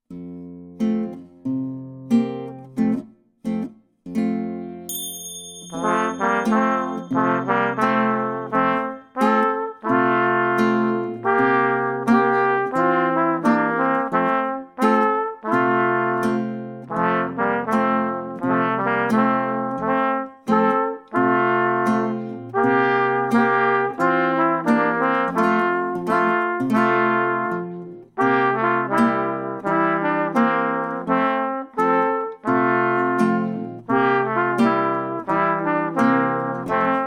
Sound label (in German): Tanz aus Maehren Duett